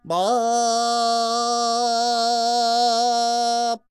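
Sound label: ambience China 3D ambience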